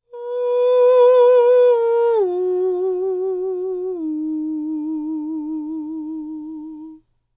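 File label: falsetto, Vocal Planet Jazz FX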